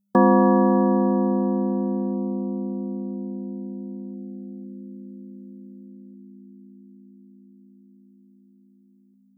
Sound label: bell